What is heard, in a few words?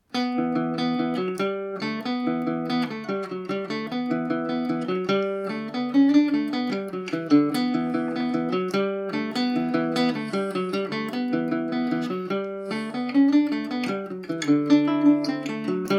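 E dorian, Irish mandolin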